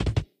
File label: roll End